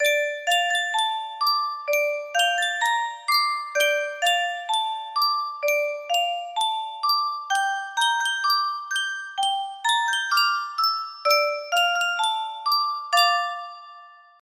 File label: yunsheng music box, music box melody